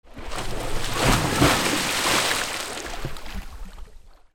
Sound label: WATER